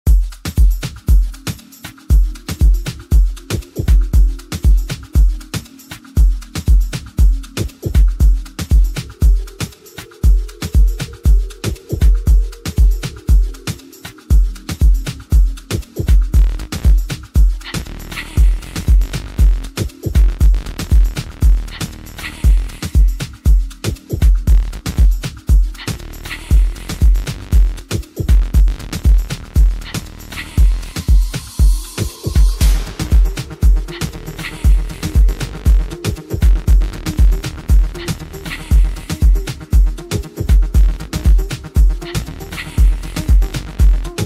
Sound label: afro 3 step remix